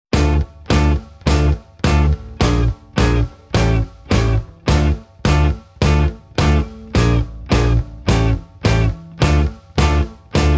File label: blues; Guitartechniques